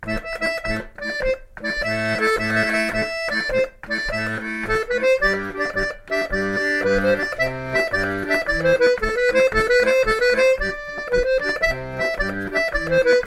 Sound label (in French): chapelloise; a jules